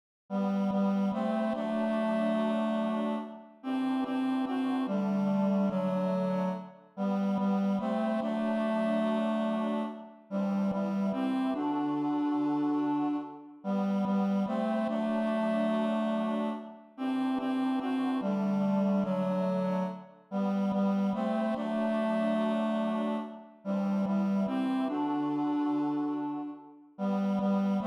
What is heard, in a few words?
Tenor; Choir